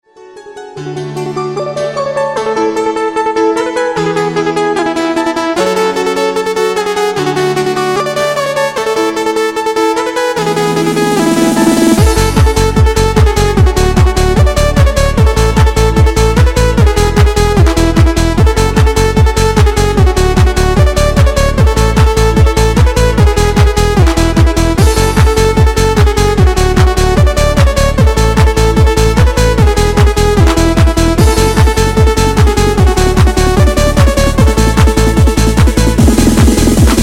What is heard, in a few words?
ringtone eurodancer